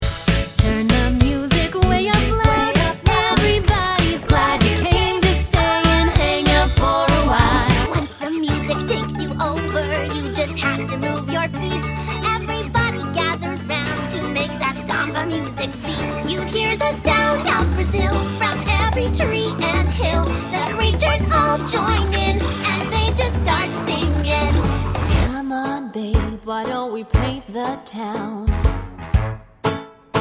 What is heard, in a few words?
Singing